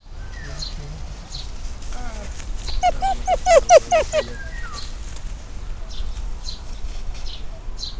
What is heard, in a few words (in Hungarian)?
nyüszögés, aranysakál; szegedi zoo 2011